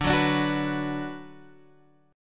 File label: D 6th D down Guitar Open G, chord